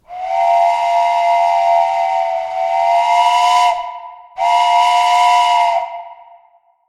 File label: train whistle